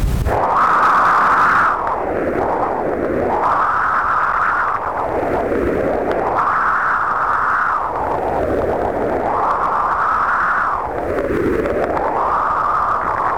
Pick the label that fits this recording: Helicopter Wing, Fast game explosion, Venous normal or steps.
Venous normal